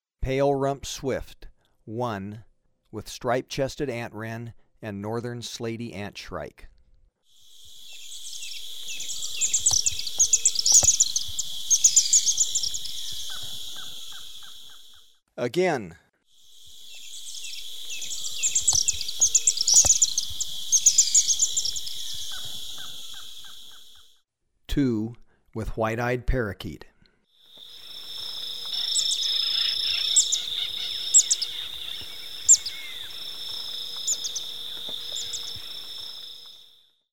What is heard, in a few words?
Pale-Rumped Swift; S. America